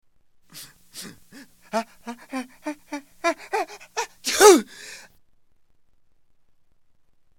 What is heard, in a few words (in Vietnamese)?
tieng hat xi hoi mai khong duoc giong dan ong